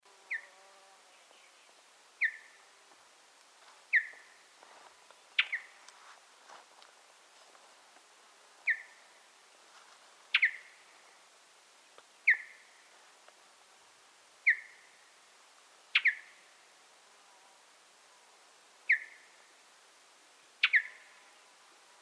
Mountain Bluebird Sialia currucoides

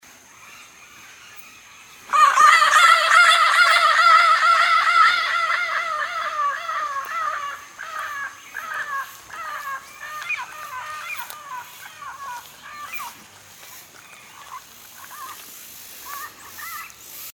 Orange winged Amazon Amazona amazonica

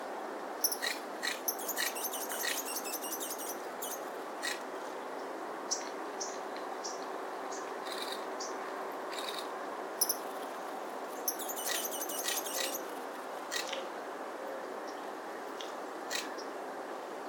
Broad billed Hummingbird Cynanthus latirostris

